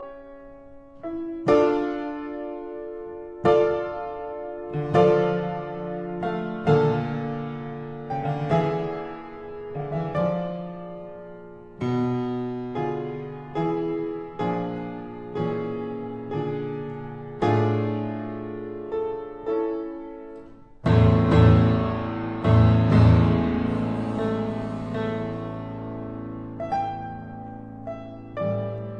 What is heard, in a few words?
Piano Sonata In D major Adagio molto Allegro; Op 40 No 3